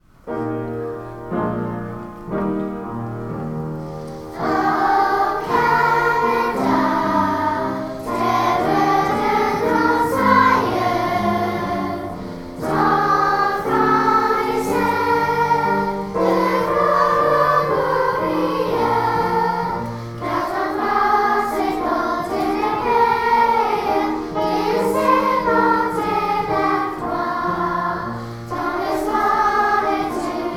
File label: O Canada Whole Choir Bilingual Version